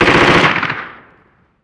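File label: gun mp5 medium; ModernWarfare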